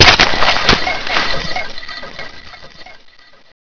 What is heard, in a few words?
bullet chain